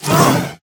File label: Spider Grunt General Scream Start Combined